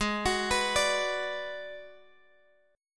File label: G sharp Minor 7th Flat 5th G sharp down Guitar Open G